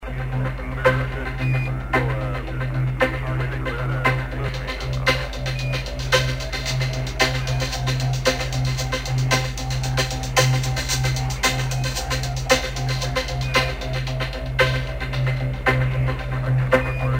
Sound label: music